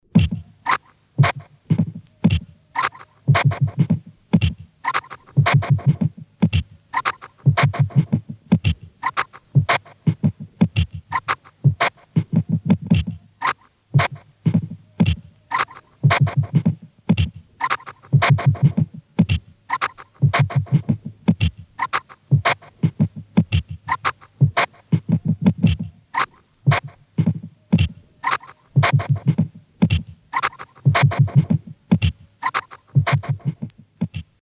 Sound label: rhythmic variation ii, Electronic Sound Patterns; His Master's Voice